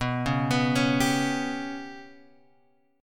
B Suspended 2nd Sharp 5th down Guitar Open G

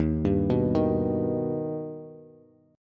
Eb Augmented Eb, Bass; arpeggiated; JGuitar

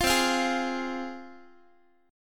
C Suspended 4th Sharp 5th, C, down, Guitar; Csus4#5 strummed; 20,20,20,18,x,x

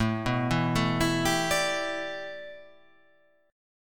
A 7th Suspended 2nd Suspended 4th

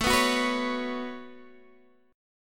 Db 7th Suspended 4th Sharp 5th A

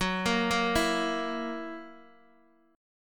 Gb 7th Gb down Guitar Open D, chord

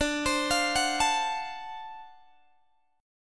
D 7th Sharp 9th, Guitar Open G

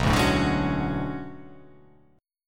B Minor 13th, chord; Bm13 strummed; x,2,0,2,2,3,3,3; JGuitar

